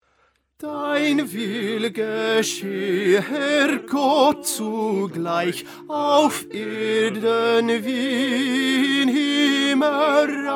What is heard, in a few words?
Tenor; Bach; St John Passion